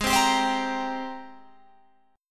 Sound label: G sharp Major 9th G sharp, Guitar Open G